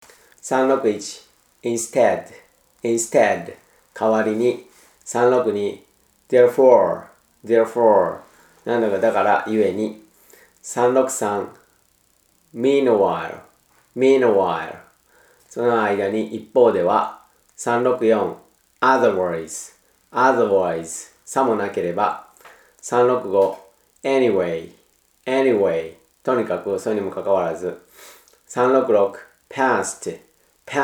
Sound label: tango